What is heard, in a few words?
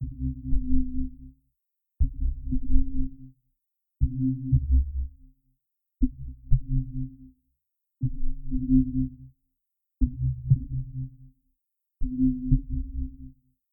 Heartbeat